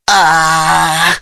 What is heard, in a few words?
aah